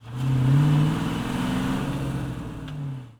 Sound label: car rev; Matt Hoffman's Pro BMX 2; XBOX